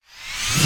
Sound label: whoosh Forth Farthest; HeavenStudioPlus; Air Rally